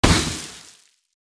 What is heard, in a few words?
Party popper confetti celebration